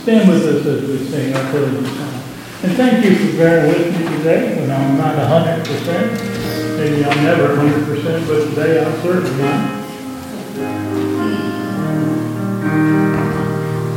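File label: Hymn, church service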